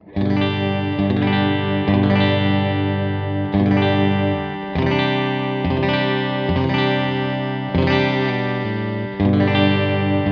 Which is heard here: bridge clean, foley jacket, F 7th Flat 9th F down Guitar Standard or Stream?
bridge clean